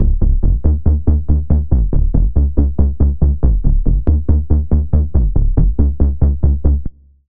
Pulse; Routed Sound; Serum 2 Expansion - Quantum Enforcer Vol.1

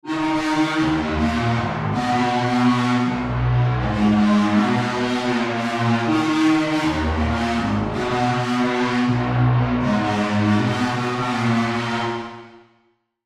Lead, Spire; Routed Sound; Spire Expansion - Street Vanquisher Vol.1

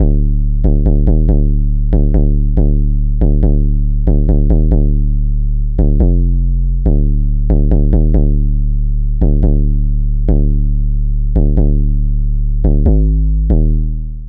808, Spire; Frantic Day; Routed Sound; Spire Expansion; Street Vanquisher Vol.1

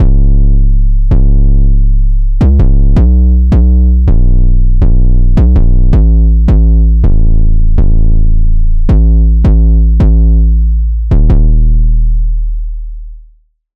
808 thick move, Sylenth1; Routed Sound; Sylenth1 Expansion - Street Vanquisher Vol.1

